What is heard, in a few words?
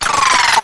Drone Hurt